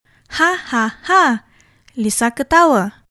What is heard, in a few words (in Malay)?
hahaha lisa ketawa